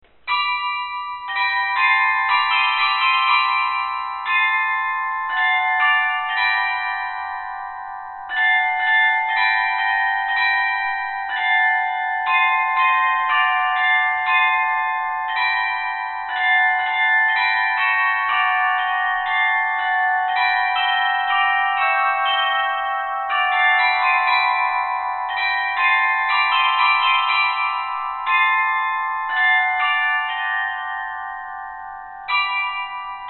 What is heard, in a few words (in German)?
Kling Glöckchen Glockenspiel; Grassmayr